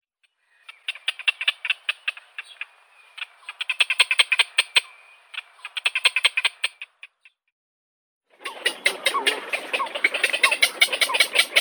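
black-crowned night heron, Nycticorax nycticorax, chick; kvakva obiknovennaya; ptenets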